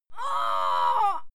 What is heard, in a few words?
female fight groan pain